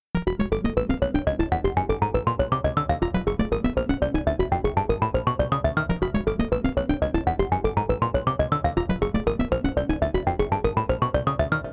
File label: arpeggiator bell octave